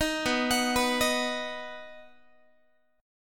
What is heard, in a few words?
B Major B, Guitar; arpeggiated; 18,19,21,21,19,x; 4A,4E,5A,5D,5G#,6C#